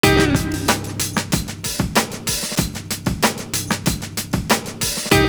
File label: DJ YAMAHA; Univers Sons